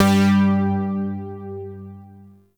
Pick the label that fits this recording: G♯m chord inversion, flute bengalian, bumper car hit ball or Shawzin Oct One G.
Shawzin Oct One G